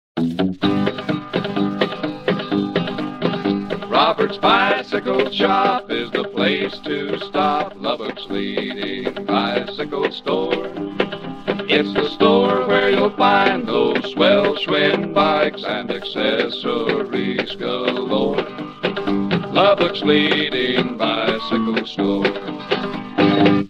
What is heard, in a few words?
Roberts Bicycle Jingle